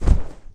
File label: duck ground; DuckShooter